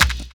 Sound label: LIVE CLAP; Univers Sons